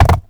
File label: Land Default, Movement; Warframe; Uriel